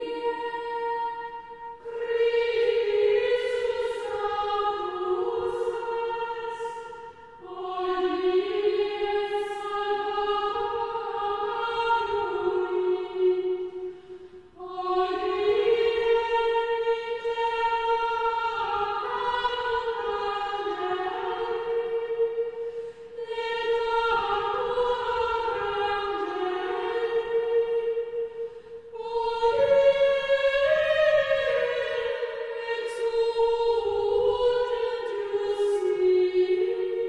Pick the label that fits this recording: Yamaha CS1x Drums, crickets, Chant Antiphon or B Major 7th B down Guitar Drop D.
Chant Antiphon